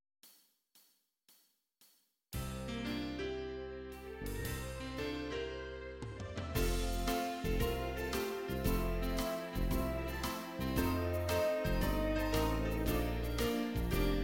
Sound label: raindrops